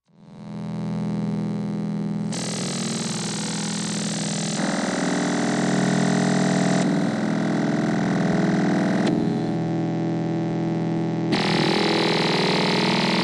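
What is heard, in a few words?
Plasmas Machine Electric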